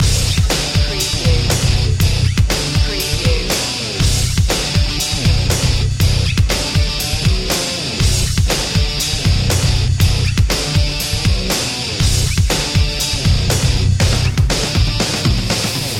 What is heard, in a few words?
armageddon loop